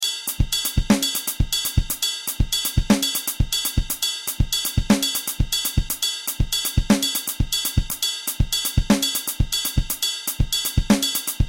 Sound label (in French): songo batterie